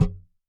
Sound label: Sliencing Strings High